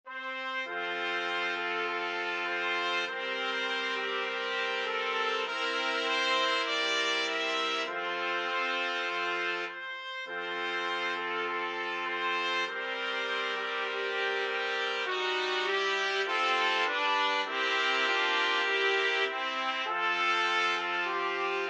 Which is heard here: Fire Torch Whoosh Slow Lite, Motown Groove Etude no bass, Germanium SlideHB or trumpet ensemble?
trumpet ensemble